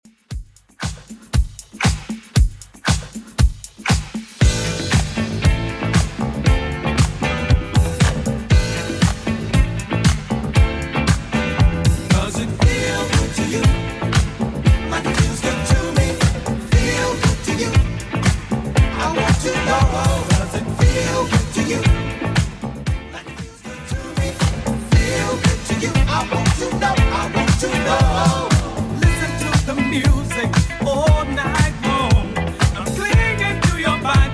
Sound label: 80s Redrum 117bpm